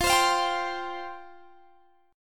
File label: F Suspended 2nd Guitar Drop D, chord